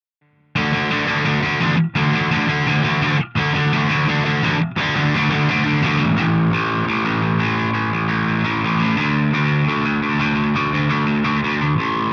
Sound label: bass, 171bpm